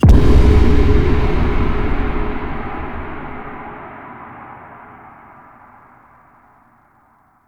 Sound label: Character Stinger; Gameplay; Warframe; The New War